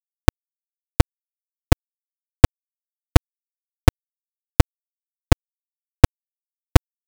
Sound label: dirac